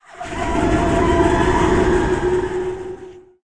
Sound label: shadow pain; warcraft3